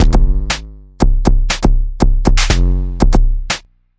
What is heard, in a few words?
breakbeat analog boinger, 120 bpm